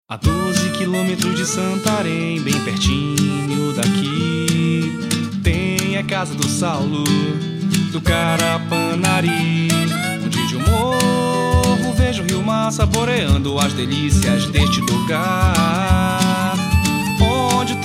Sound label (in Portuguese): jingle harmonica; Jingle santareno